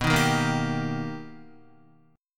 B Augmented Major 7th B Guitar, chord; B+M7; strummed; JGuitar

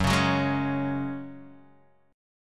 F sharp Suspended 2nd, Guitar Half Step Down

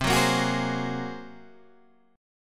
A sharp 7th Suspended 2nd Sharp 5th C

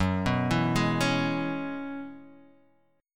Gb 9th Suspended 4th Gb down Guitar Standard; arpeggiated; JGuitar

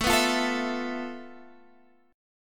F Major 7th Flat 5th A, guitar chord

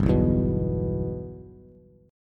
G 6th, Bass; G6 strummed

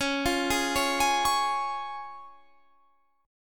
Db Major Db down Guitar Standard; arpeggiated

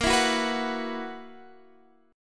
B Major 7th Suspended 4th A sharp

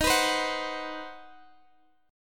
C Minor Major 7th Flat 5th Eb, chord

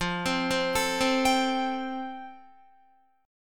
F Major Add 9th, Guitar; arpeggiated

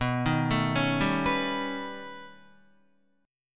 B Augmented 9th B Guitar, chord; arpeggiated; JGuitar

